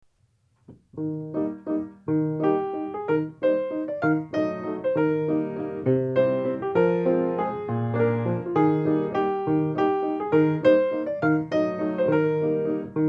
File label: waltz